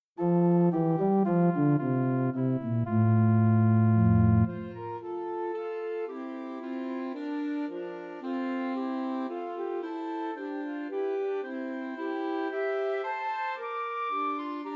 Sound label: While Shepherds Watched Christmas Trios for Flute Clarinet and Alto Saxophone